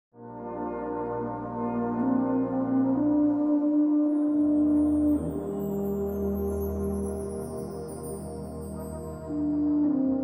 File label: bass cad